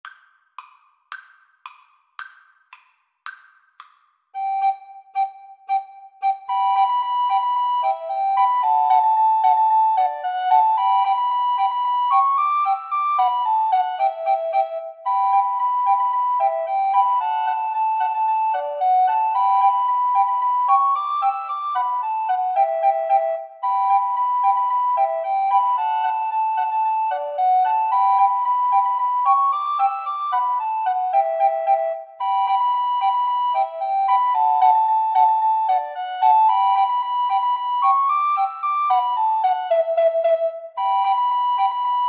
drunken sailor, Recorder Trio

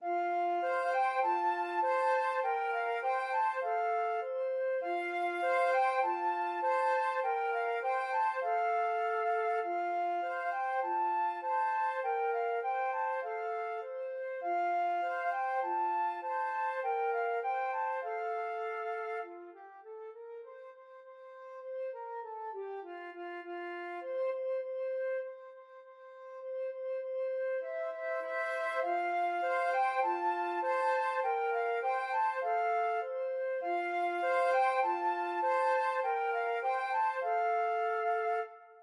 alouette flute duet

